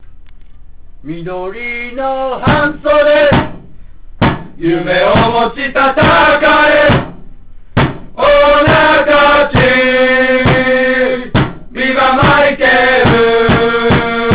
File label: song